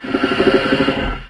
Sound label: search, antlion guard; hl2